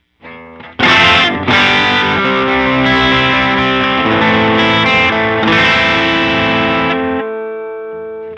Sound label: tube delay